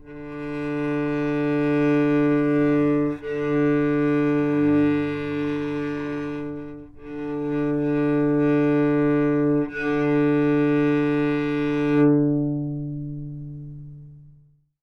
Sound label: D3 Sustain, Novice Cello